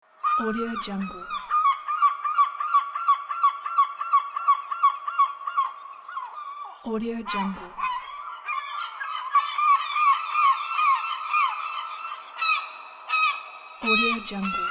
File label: seagulls